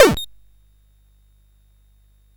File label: Paddle Mania, CSG8580R5